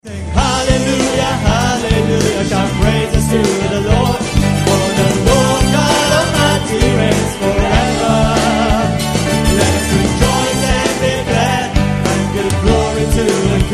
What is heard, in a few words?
make a joyful noise